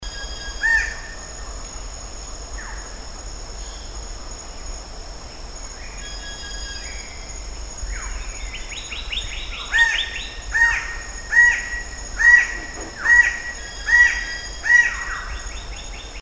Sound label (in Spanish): sonidos ave del paraiso roja rubra